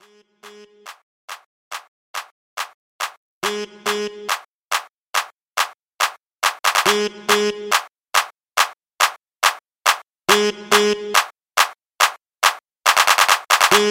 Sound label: RB Clap Starter Rework 140 BPM